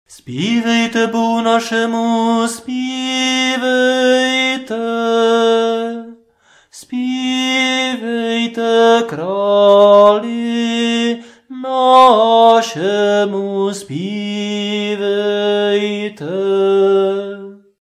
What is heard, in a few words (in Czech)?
Nedelni prokimen hlasu